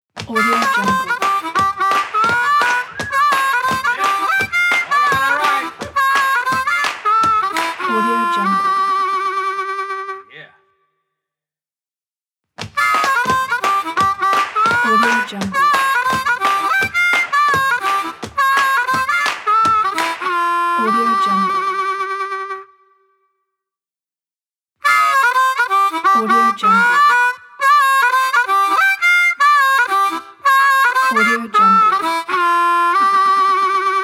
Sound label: harmonica stomp ident